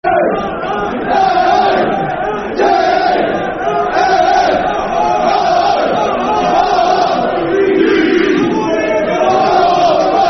W a t f o r d, Football Chant (Soccer Song)

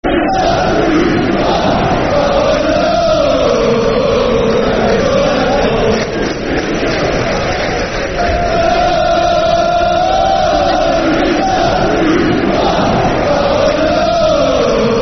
Football Chants (Soccer Song); John Arne Riise; Chelsea Cfc

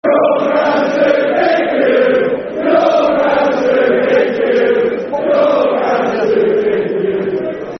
Your Ground's Too Big For You, Football Chants; fanchants; Ipswich Town Itfc

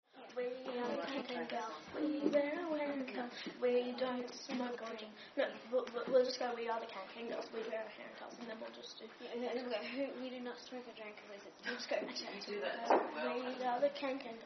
Clapping

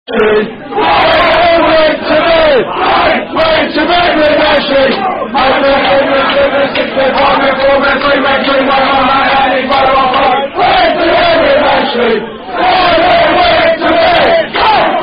Football Chant; Ten Men Went To Bed, Went To Bed With Ashley

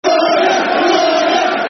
Who Are Ya, Football Chant; Yeovil Town Ytfc; fanchants

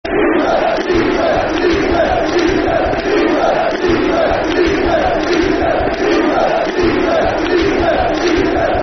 Easy Football Chants (Soccer Song); Birmingham City Bcfc

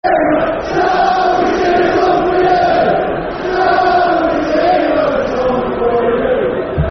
Football Chant (Soccer Songs)

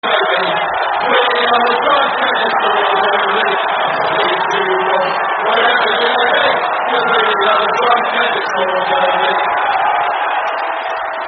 Drunk And Disorderly No Cheer, terrace chants; Reading

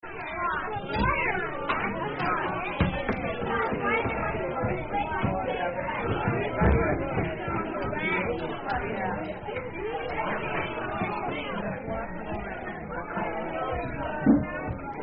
Eating, Happy Birthday; 1959; the sounds of camp

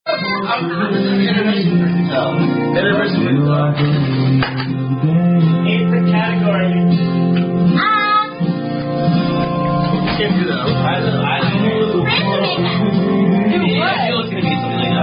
Drinking Game; 3 Man; Three Drinking Game

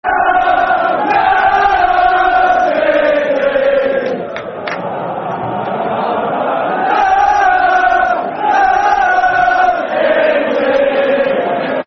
Na Na Na City, Football Chants, Man City; Man City Mcfc

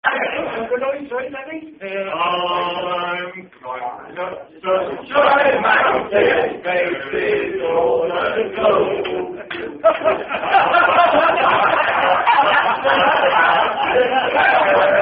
Rugby Song

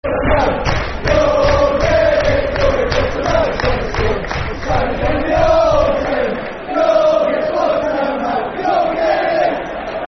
Football Chants (Soccer Song); You'll Get Foot & Mouth; Birmingham City Bcfc

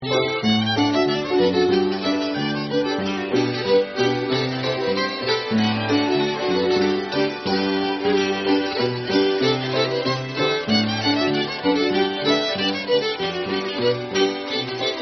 Schottische G; Nameless Schottische

